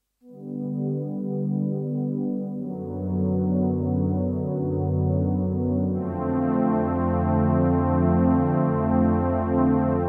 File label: Strings